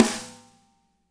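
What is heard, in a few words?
snare left; Spectrasonics; Burning Grooves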